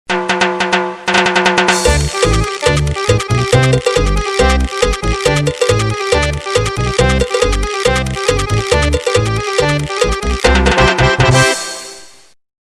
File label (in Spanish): Cumbia de la Urraca; Abrapalabra La Magia de Aprender a Leer; macOS; Windows